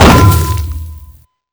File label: mace hit wall; boss; sewer